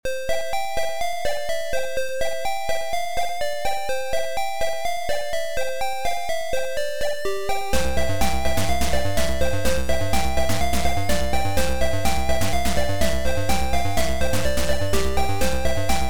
Game music; Wopgamma

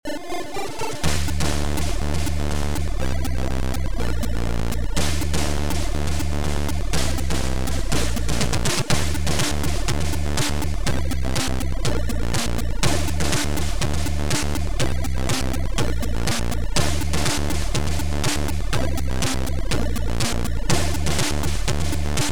Single Notes

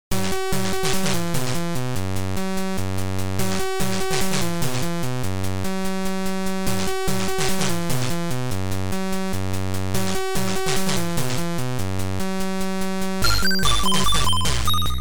BREAKBEAT; Karp; KARP 1995

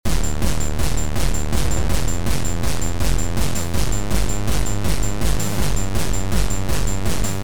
BEAT

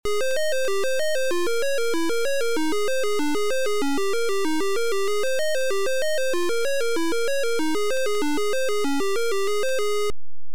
Title, Beeper; Alien 8

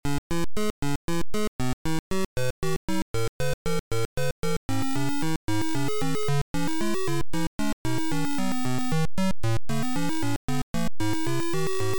Title Beeper; Gyroscope

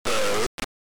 Title AY Beeper; The Arc of Yesod

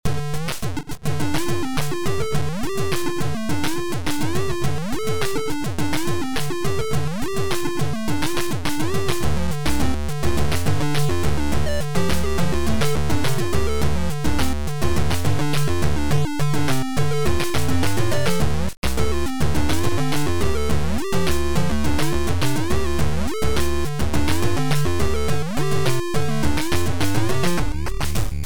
Funky techno

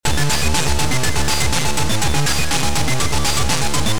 ROBOTIC

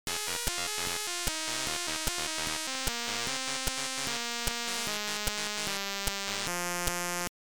Title Beeper; Milk Race

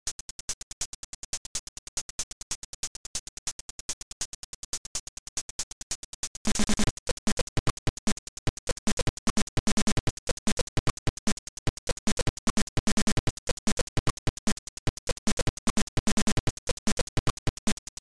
Title AY Beeper; Chase HQ

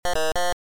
Title Beeper; Bruce Lee

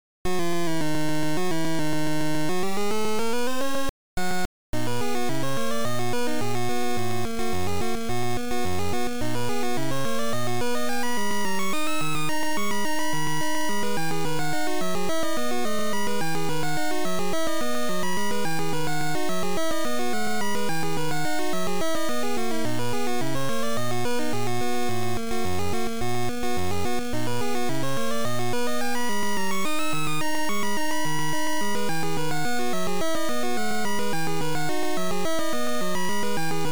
Title Beeper; Star Swallow

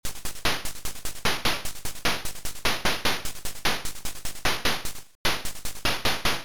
DRUM

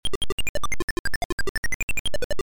Title, Beeper; Krakout

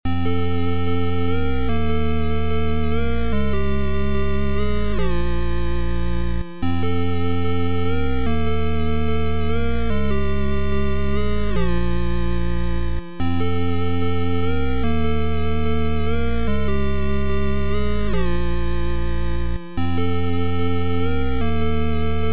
Game Music Effect Collections

